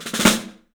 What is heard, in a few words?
STEEL ROLLS; 3D Drums